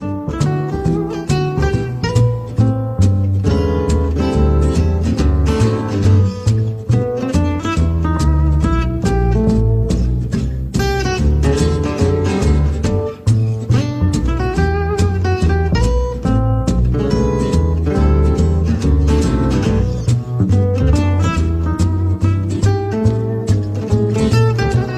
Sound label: close mic on a large indoor stage, guitar